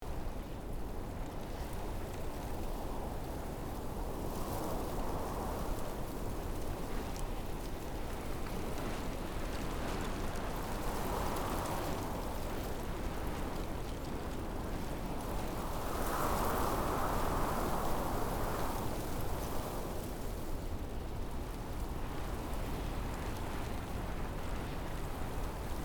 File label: Mars gentle wind with sand ambience